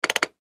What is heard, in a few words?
Button Switch Gear Multimedia; Web Interactive; Blastwave